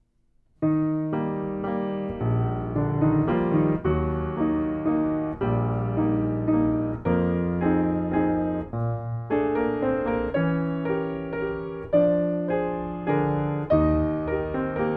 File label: Waltz in Three Four